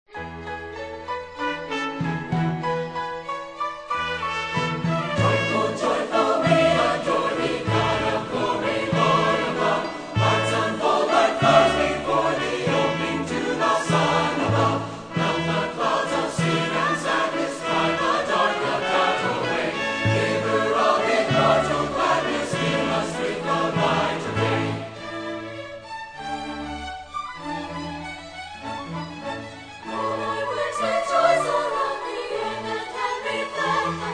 Choir And Orchestra

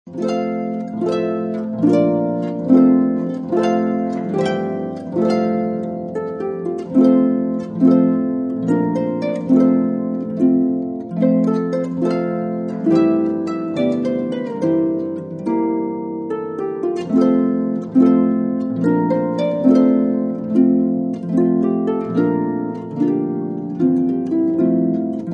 Blessed Assurance, Harp Hymns

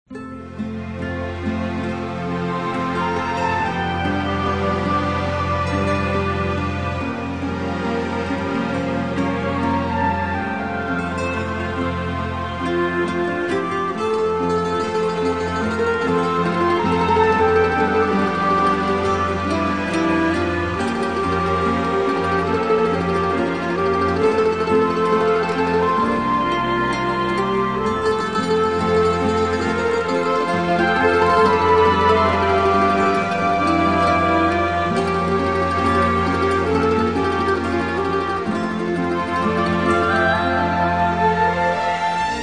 Orchestra, Strings; Majesty Orchestra

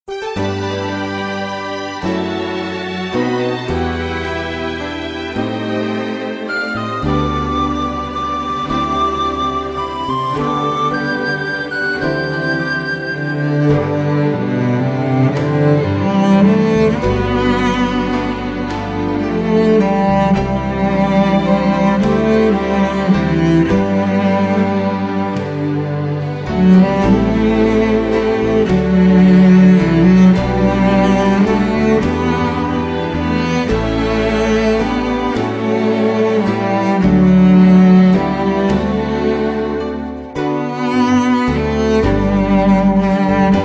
Instrumental Hymns

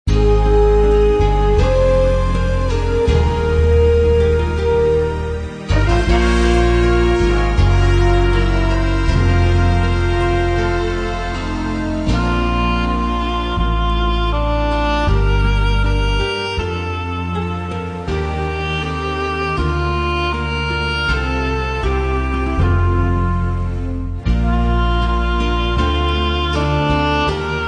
Instrumental Hymns

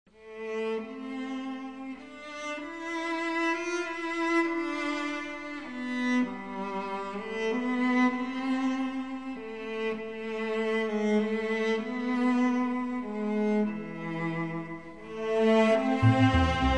Strings, Christmas

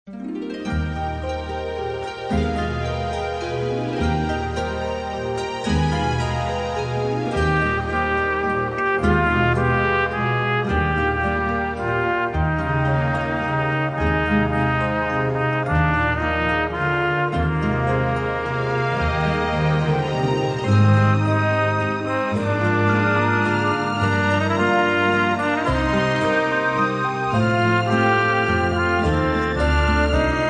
Orchestra, Strings; Majesty Orchestra

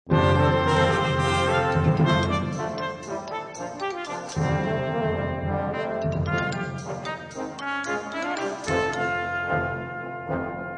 Brass Choir

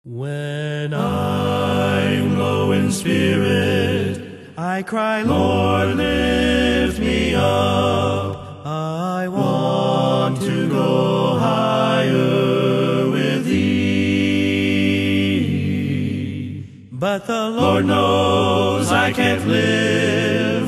A Cappella

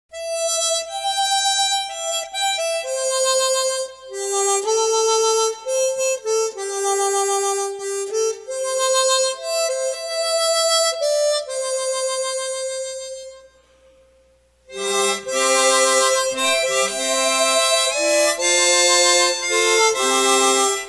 Amazing Grace Chromatic Harmonica

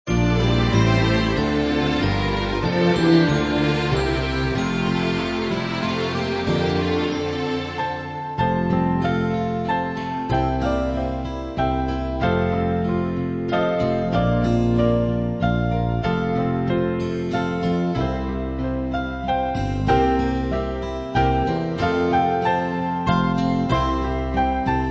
Instrumental Hymns

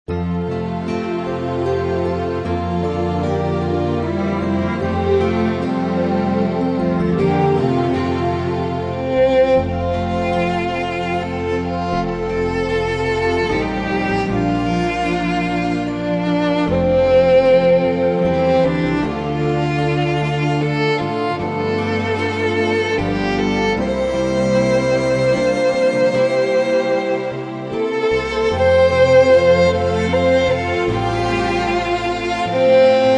Instrumental Hymns

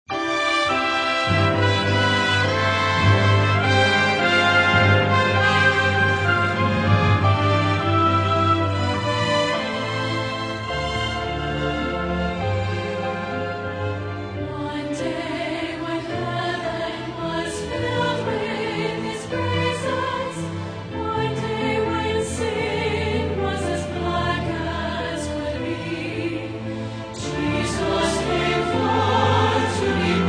Singers And Orchestra

